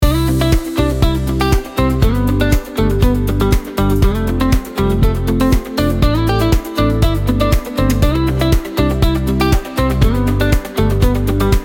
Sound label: 120 bpm Rhythm Guitar Background, instrumental; 34 sec; ai social media music